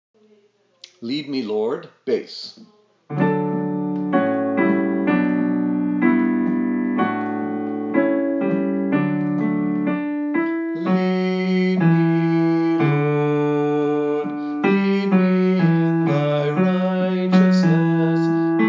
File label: Bass; Wesley; Lead Me Lord